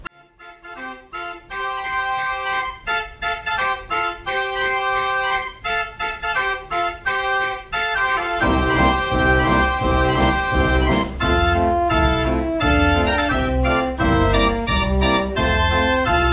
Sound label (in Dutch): postkoets, draaiorgel